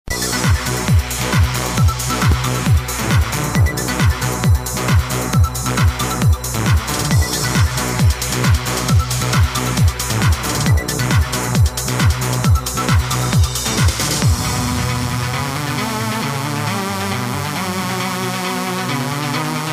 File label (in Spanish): Electronica; honduras musical 9no grado